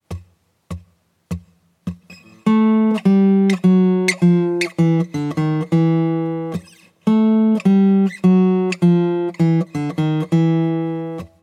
Blues Guitar Turnaround

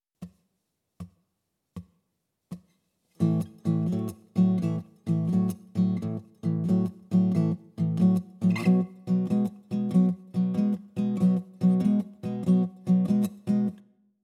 Open Tunings For Blues Guitar

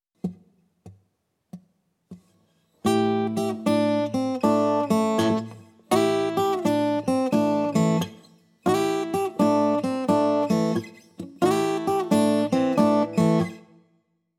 Open Tunings For Blues Rock Guitar; An Easy Guide To Open Tunings For Blues Rock Guitar

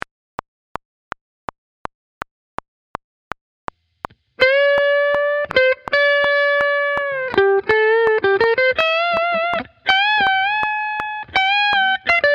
Paying Guitar with Expression

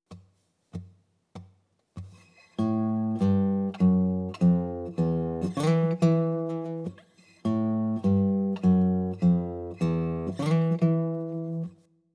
Open Tunings For Blues Rock Guitar

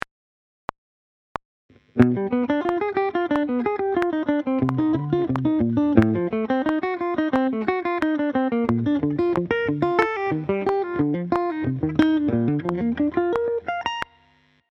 Hybrid Picking

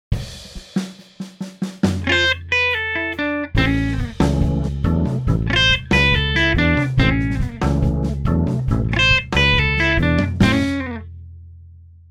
Blues Rumba guitar

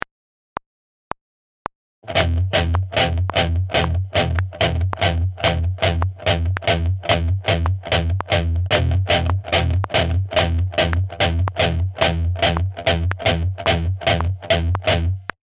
Metal Rhythm Guitar

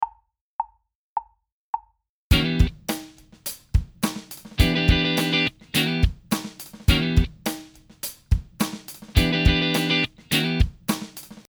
Minor 7ths

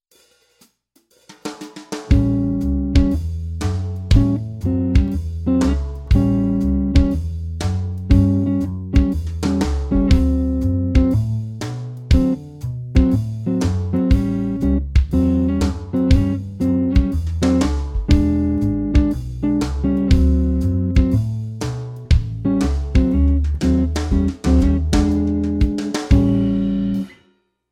blues guitar comping

